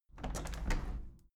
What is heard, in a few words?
window handle plastic squeak close soft; objekte; fenster